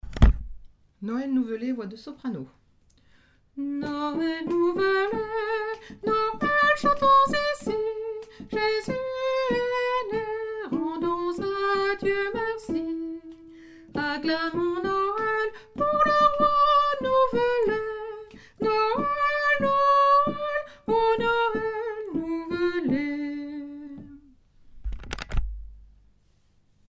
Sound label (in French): noel nouvelet soprano